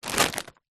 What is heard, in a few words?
food potato chips crisps large bag pick up; zapsplat